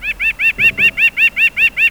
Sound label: wren